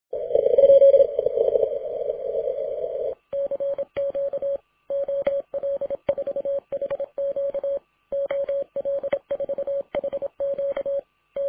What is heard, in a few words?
cw; IARU HF - 40M CW at OL4HQ; A last sweep of 40M CW at ~05:15 before moving up to 20M SSB.